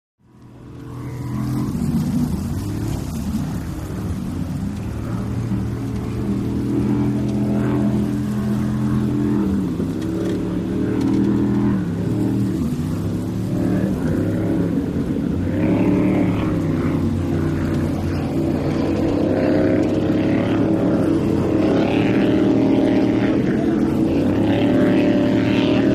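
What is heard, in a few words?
Power Boat Race Ambience, off shore power boat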